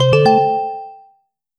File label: Transfer Pending